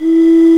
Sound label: MOTTLE E3, pipe